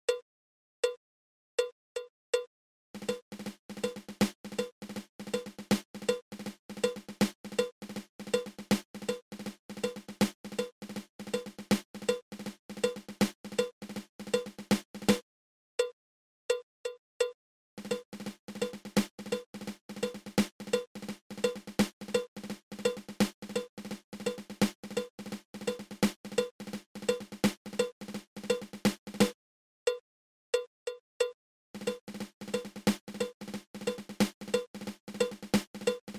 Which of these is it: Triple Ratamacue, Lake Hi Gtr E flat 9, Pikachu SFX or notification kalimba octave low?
Triple Ratamacue